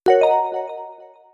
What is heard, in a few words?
beep hi